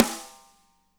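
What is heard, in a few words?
snare right; Spectrasonics; Burning Grooves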